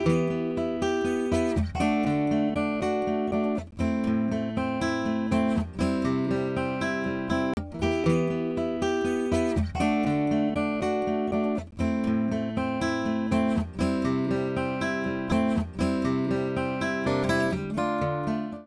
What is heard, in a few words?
Acoustic Gtr